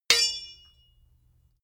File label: sword hit sweetener